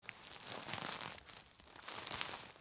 foley forage; Collecting; Zomboid; Obvious Collecting

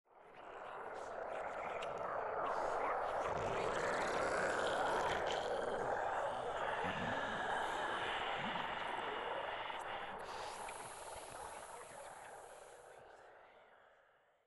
Zombies; Zomboid; The Calm Before The Storm

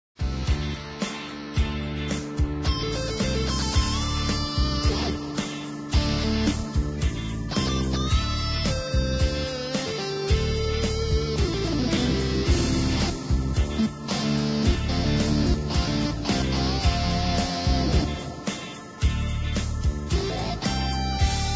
Keytar; Zomboid; Lifestyle

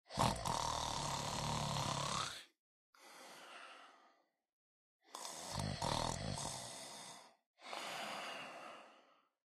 snore; Zomboid

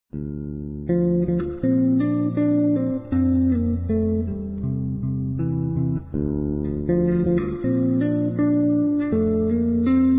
Guitar Electric Bass

